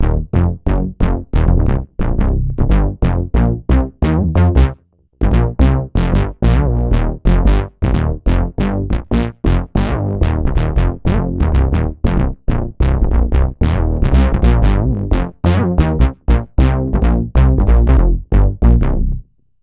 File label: Funky Bass